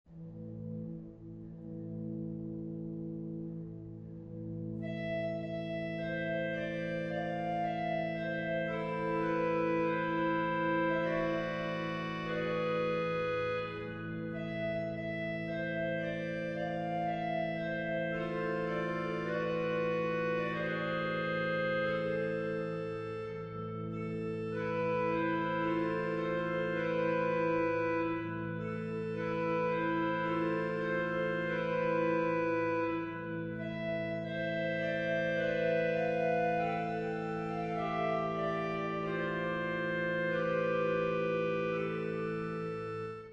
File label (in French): clarinette